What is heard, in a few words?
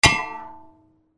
Metal; game inn